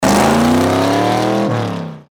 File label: cobra 427 rs